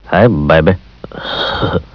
baby

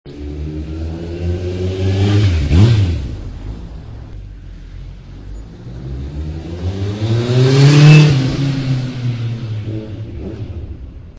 bike

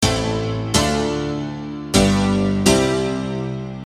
Piano Loop

